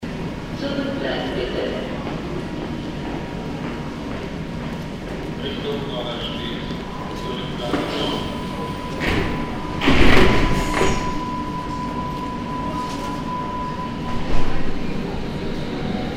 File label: subway double departure